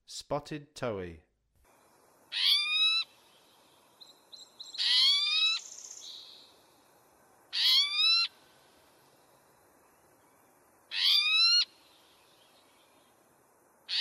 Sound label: Spotted Towhee; North America; Western USA; Western USA Songbirds